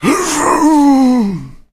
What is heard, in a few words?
fracture idle; Soundscape Overhaul; monsters